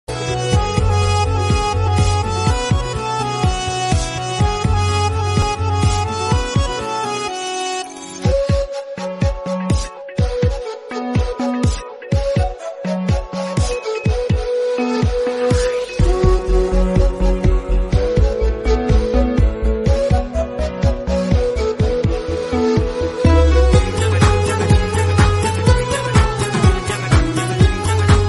instrumental romantic ringtone

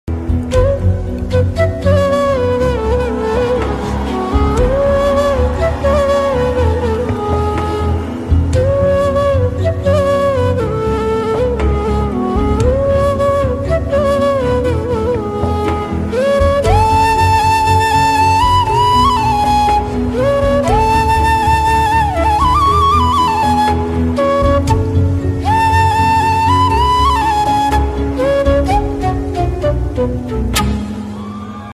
flute cover; Roja

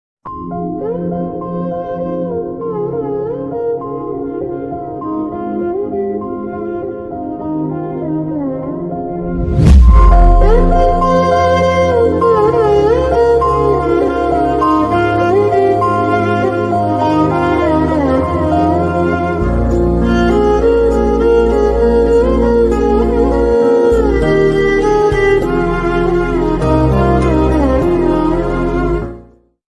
sad friendship emotion bgm

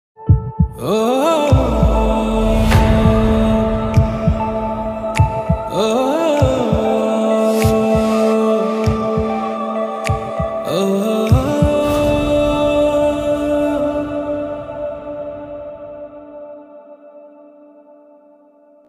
love bgm telugu love bgm music; hridhayam

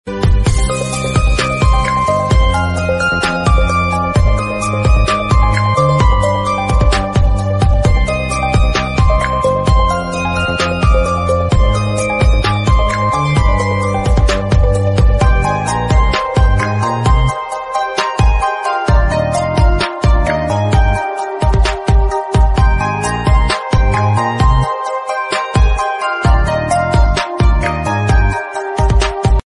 bgm ringtone instrumental; majili

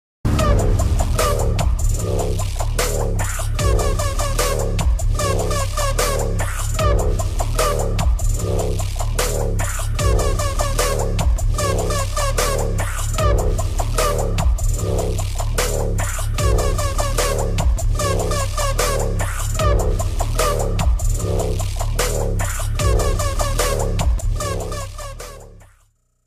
theme bgm mix entry mass bgms; Khiladi

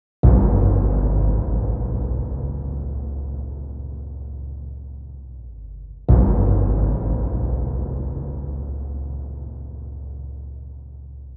Title Music Ringtone; Animal

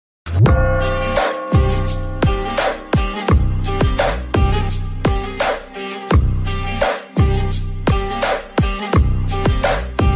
bgm ringtone love ringtones mass bgm

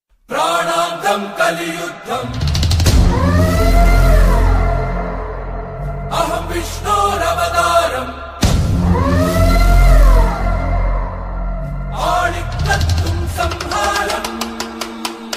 kalki intro bgm ringtone

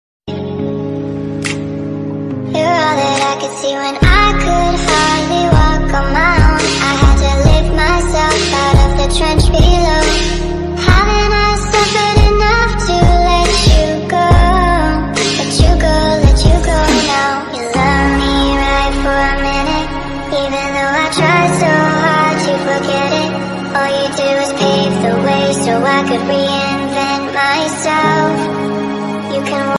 english remix ringtone slow mo ringtone

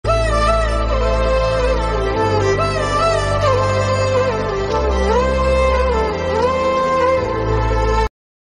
love ringtone, love bgm; vijay

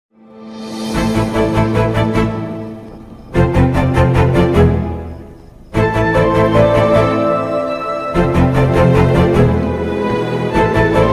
love bgm, emotional bgm; dj tillu

